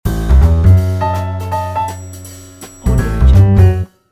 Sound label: short jazz intriguing logo